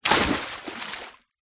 nature water splash

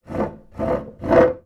household chair wooden drag hard floor; zapsplat

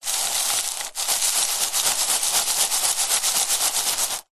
nature ice crushed pieces shake

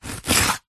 food capsicum pepper chop with knife

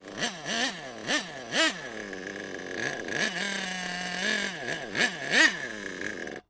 leisure toy rc car nitro engine rev still

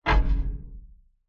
impacts wood hit vibrate slap weird; zapsplat

